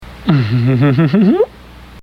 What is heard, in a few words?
Evil Laugh; Max Headroom; The Max Headroom Show; Mary Tyler Moore